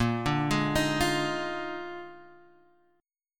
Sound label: A sharp 11th, Guitar Open F; arpeggiated; JGuitar